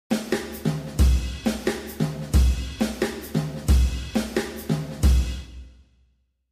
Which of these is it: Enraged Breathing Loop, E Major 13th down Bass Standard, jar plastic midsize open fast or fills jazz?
fills jazz